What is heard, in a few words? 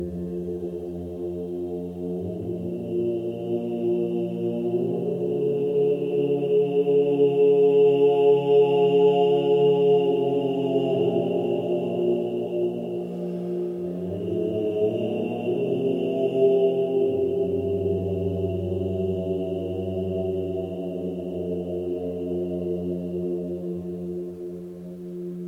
Meditation with aliquot